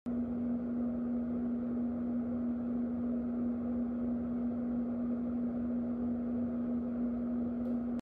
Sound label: ceiling fan; پنکه سقفی